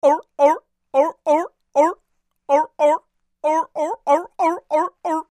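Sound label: seal imitation by voice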